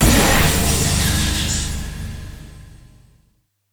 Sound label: magic burst